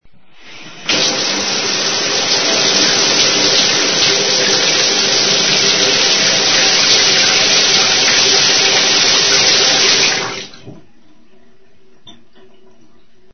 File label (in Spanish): Ducha; Sonidos/ruidos; RUIDOSCASA